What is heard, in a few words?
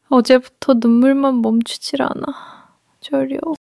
crying